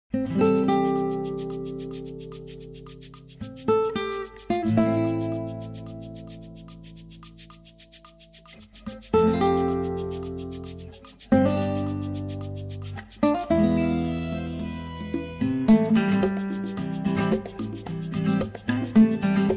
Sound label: Amazing Chill Guitar; CHILL OUT SUAVE